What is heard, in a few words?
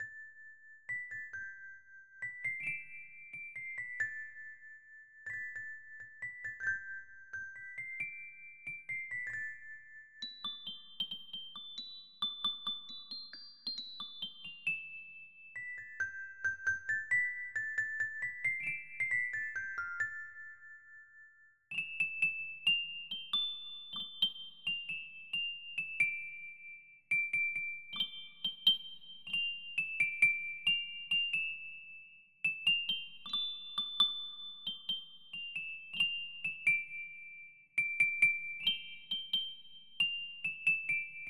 kalimba